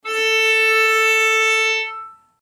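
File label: draw bow, violin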